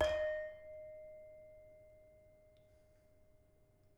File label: Saron wrong, gamelan